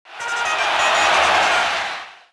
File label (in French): Course sportive